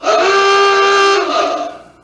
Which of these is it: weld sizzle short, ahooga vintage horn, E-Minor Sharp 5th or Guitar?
ahooga vintage horn